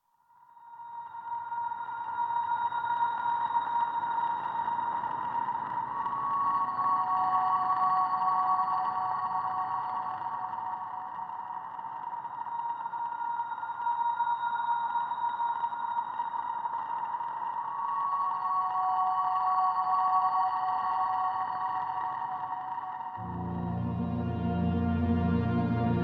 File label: Plains Day One Amb Synths; Warframe; DE; Dynamic Music; Eidolon Plains